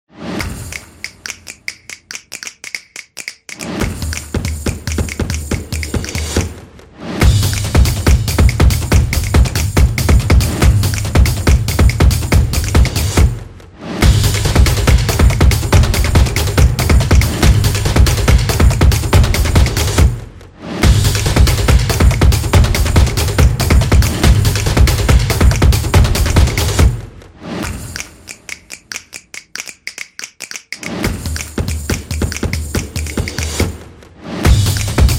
Fashion Beat; casual game

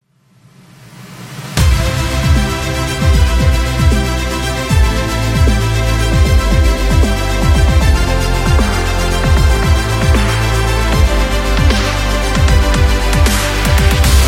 Epic Positive Dubstep; epic sport metal

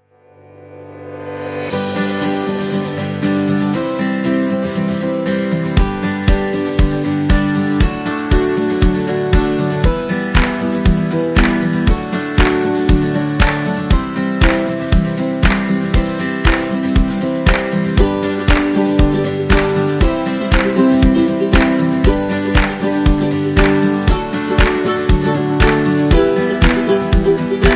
Holiday Kids, happy music

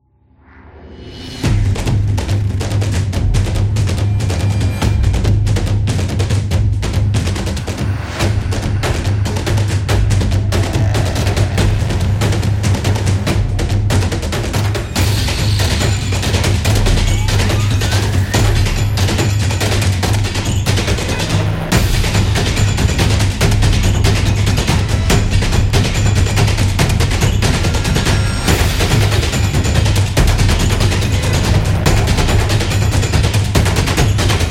Tribal Drums Run; tribal dance

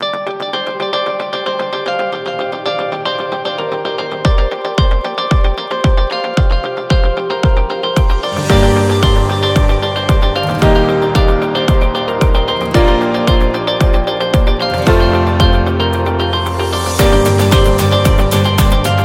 Tech Beat; agency promo

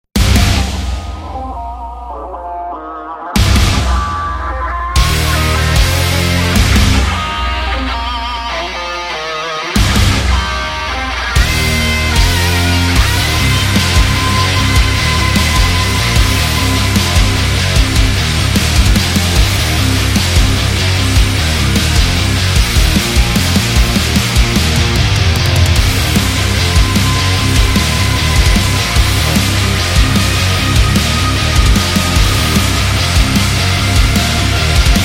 Biker Metal

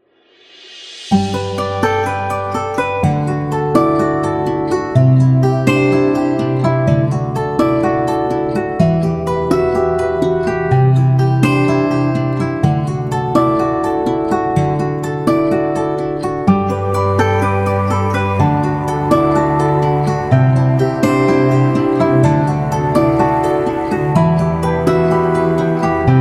Speech Background; eco-friendly

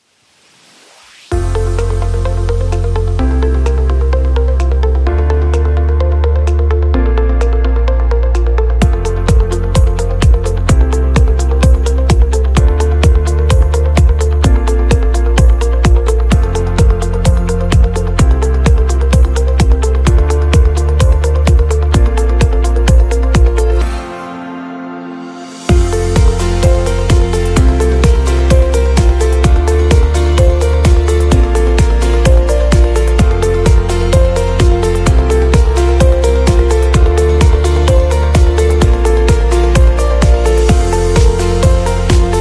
Upbeat Corporate

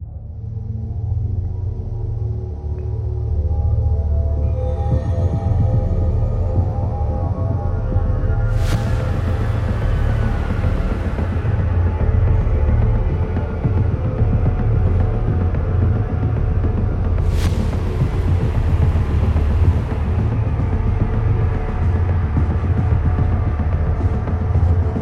Suspense Background

